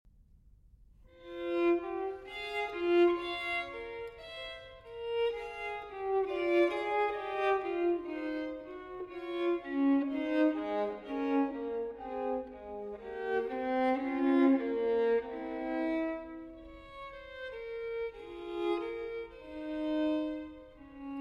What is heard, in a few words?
Solo Violin in A Major Andante; No. 5; TWV 4018; Telemann Fantasia